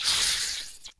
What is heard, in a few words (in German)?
Monster